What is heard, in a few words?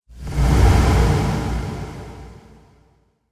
Cinematic Strike Reverb Wave, trailer sound effects